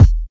present kick drum single hit e key, Royality free steel kick drum sample tuned to the E note